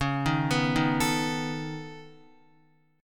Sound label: C sharp 7th Suspended 2nd Sharp 5th, C sharp, down, Guitar; C#7sus2#5 arpeggiated